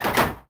lever pull

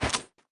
gun catch

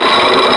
psyche

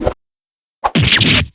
stab; anna

